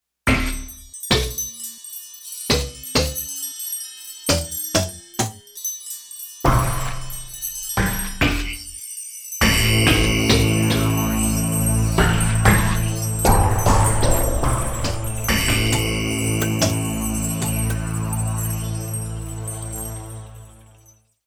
FX Menu

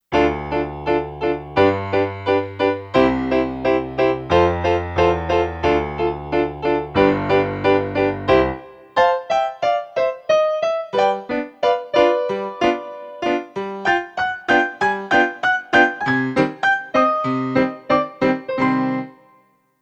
Stage Piano, Kurzweil K2500X; Factory Programs

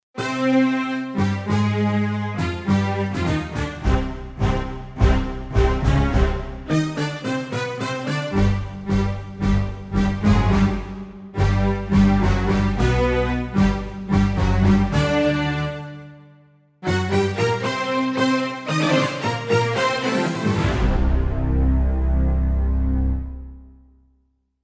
Orch Stab, Roland JV-880; Factory Patches

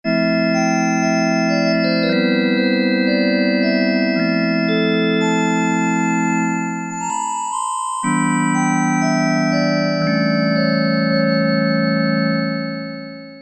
TRIANGLE COMP, Oberheim OB-8; Factory Patches

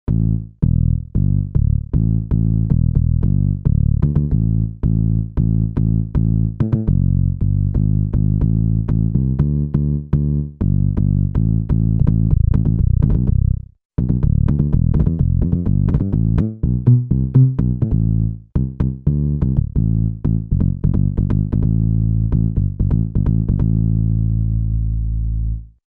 House Bass; Factory Patches

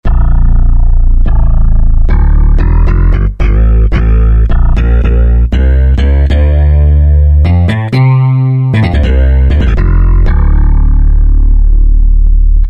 Appoint Bass, Roland JD-990; Factory Patches

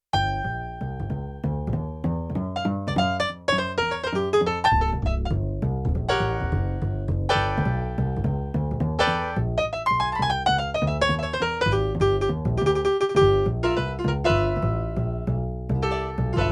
Piano Bass Split; Yamaha TX16W; Jazz Performance; Jazz Performance 2

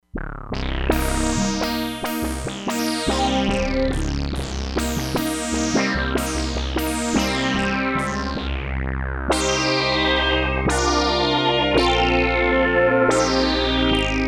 HI-RES SYNTH, Crumar Bit 99; Factory Programs

